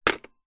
household telephone vintage rotary style hang up receiver; zapsplat